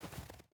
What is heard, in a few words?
footstep rubber boots snow walk; Rust